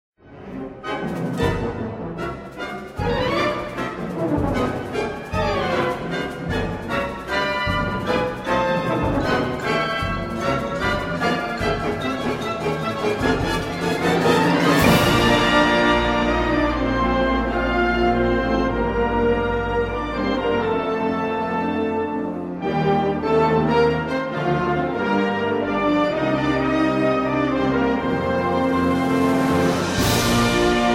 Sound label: train chase